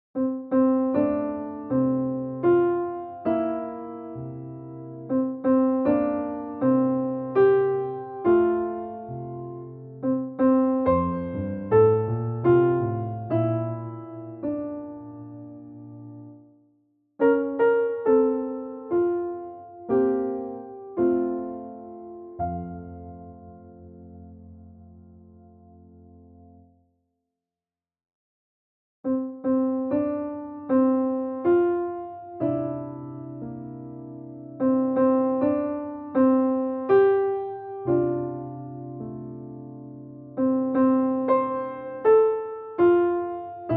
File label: Happy Birthday, piano